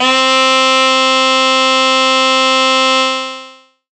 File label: Ethnic Synths, Dance Synths